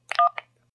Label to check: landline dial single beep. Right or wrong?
Right